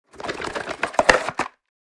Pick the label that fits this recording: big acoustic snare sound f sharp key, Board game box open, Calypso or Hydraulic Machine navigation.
Board game box open